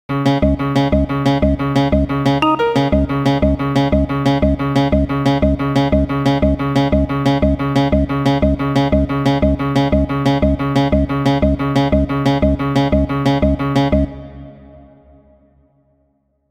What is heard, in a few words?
mutated g sequence